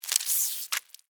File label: Alien Spider Web, Scifi, Alien, Spider, Web, Shoot, Insect; BlastWave